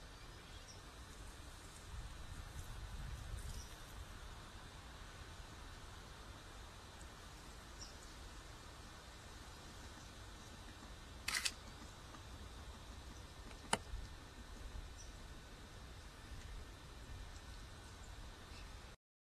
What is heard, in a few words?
woodpeckers